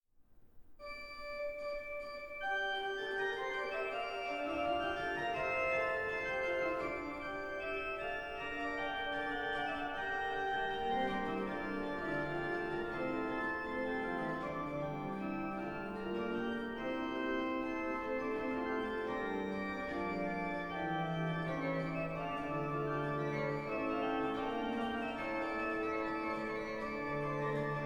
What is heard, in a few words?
Music for Brass II Canzon in G minor; Gabrieli